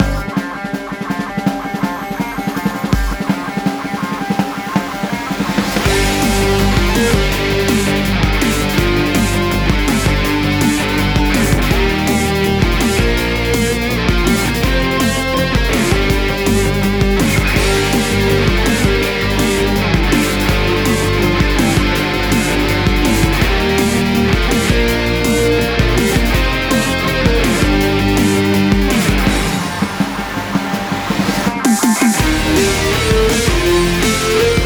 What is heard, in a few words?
Level Mountain Loop; running gods